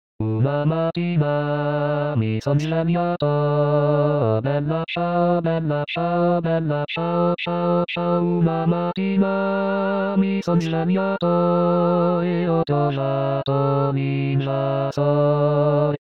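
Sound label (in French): bella ciao hommes; 2023 st jean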